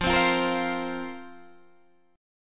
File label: F Suspended 4th F, Guitar Half Step Down; Fsus4